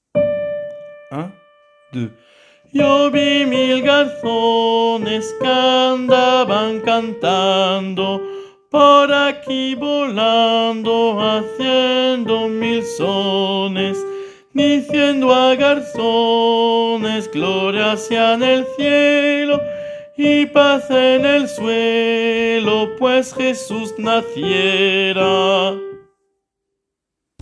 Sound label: couplet soprani; la bas dans la prairie